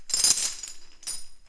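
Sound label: Chain Movement; Civ4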